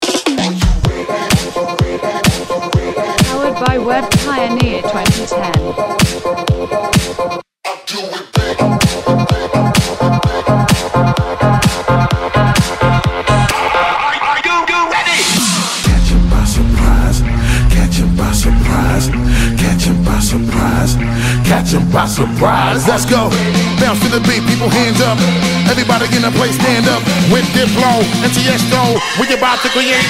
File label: bass